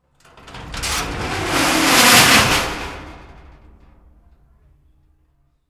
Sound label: Gate Big Rusty Metal Door Garage Open Heavy Creak Rattle